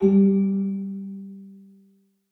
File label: melody G3; fairymirror